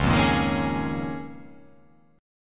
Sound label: C sharp 6th Add 9th C sharp down